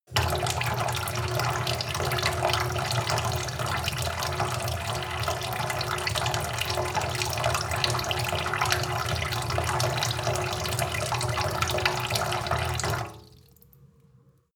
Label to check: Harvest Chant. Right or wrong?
Wrong